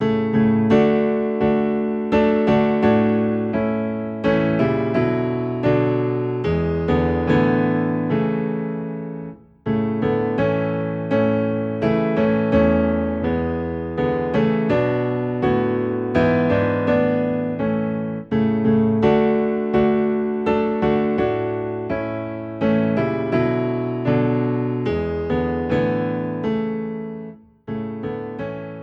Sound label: Piano Low in A; Children of the Heavenly Father